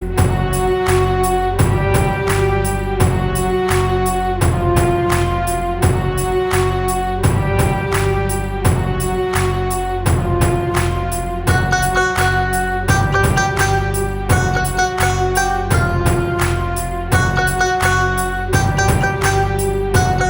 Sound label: BGM Cover; Tanhaji